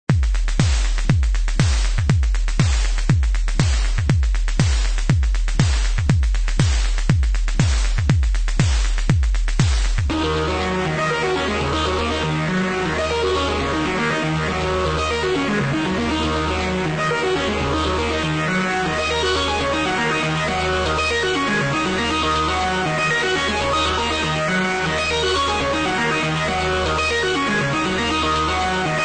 Loop

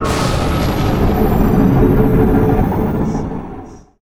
Dark Magic Cast Spell; Lucius III; pc linux

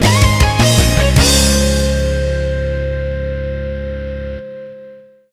Goal; F355 Challenge Passione Rossa; Dreamcast

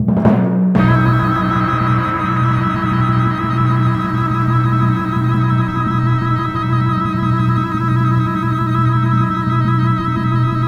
Boss Warning; Legend of Zelda The Link's Awakening 2019 Remake

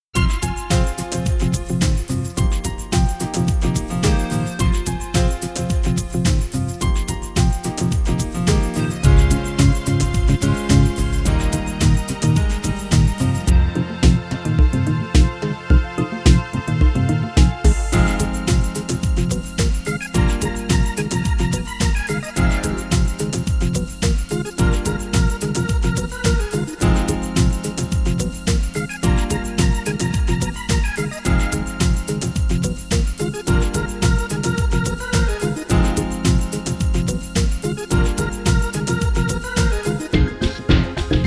Lute; Fantastic Fortune 2

